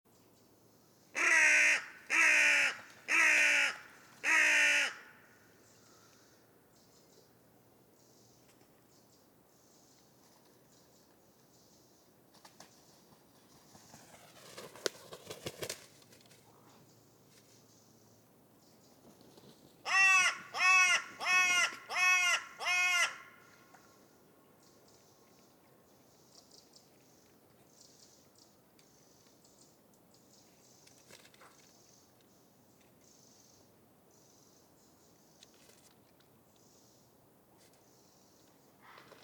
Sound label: Corvus corone; SETE